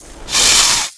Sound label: Snake In Damage; Seer; GamigoNA